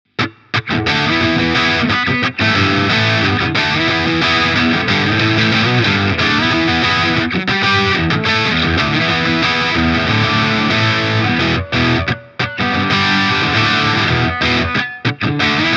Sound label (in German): Yamaha Revstar RSS02T Steg Rockriff